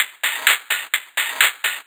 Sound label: FX Loop 128 BPM, House